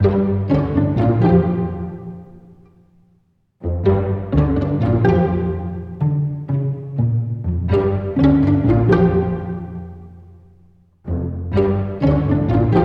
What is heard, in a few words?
Intro music Layer 125bpm; Dude Stop